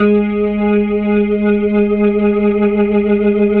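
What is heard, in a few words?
2G# R, Slow Fast Hammond, Organs; E-MU Producer Series Vol. 5 – 3-D Audio Collection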